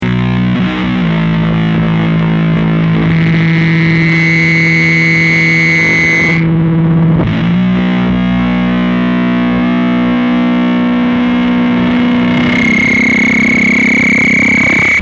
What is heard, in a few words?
feedback